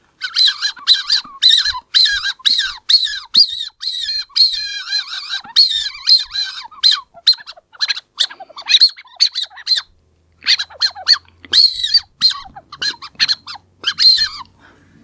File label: Blue Vixen, fox caller; T2 Black; 3D printed fox caller